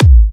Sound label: Kicks G#; markus hakala